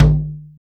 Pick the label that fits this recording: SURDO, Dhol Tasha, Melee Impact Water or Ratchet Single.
SURDO